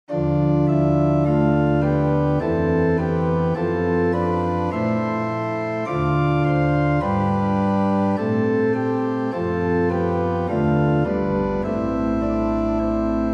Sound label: Dorian song wet, organ